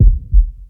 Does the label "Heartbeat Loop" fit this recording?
Yes